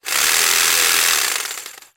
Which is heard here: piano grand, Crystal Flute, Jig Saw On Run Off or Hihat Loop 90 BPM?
Jig Saw On Run Off